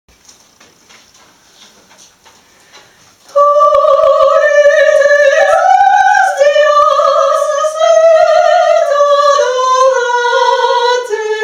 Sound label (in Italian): soprani; tollite hostias